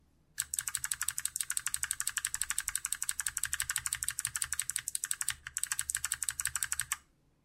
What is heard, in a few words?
Kailh BOX White ESC; SGK50 S4